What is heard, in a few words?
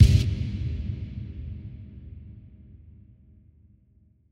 Tom